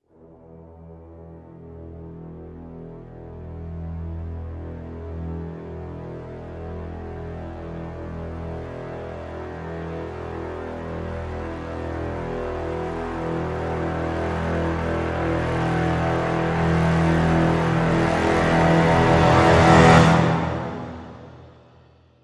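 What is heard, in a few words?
Crescendo Brass Swell Lo (E)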